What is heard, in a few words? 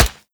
dirt, bullet impacts; physics; Half Life Model Pack